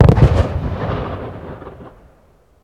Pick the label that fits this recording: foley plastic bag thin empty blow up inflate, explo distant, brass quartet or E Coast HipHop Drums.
explo distant